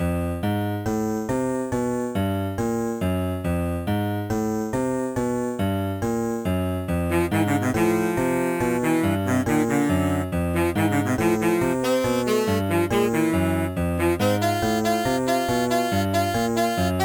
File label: jazz ostinato; Deluxe Music Construction Set